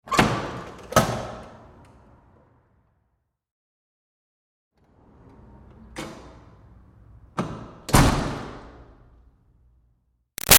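Door Metal Commercial Push Bar Interior Medium Open Close; Metal Doors; Door Metal Commercial Push Bar Int Med O-C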